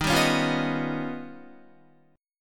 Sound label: Eb Augmented 9th Eb down Guitar; Eb+9 strummed; JGuitar